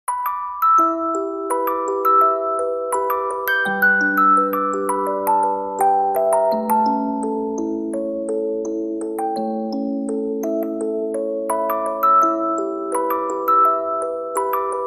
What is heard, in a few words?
Music Box; Sega ‛＂Sonic CD‛＂ Theme Song